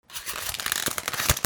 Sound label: FRICTION